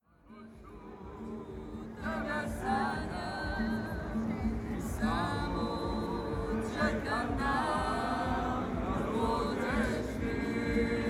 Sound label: Sound Night Singing